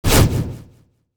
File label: SKILL STAFF COSMIC ELEMENT; Fly For Insanity